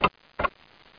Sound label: Clock Tick